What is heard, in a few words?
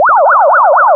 Police Echo; ELK-120